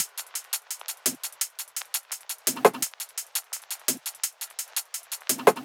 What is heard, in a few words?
170 Perc